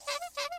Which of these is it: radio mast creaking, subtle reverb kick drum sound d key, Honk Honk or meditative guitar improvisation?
Honk Honk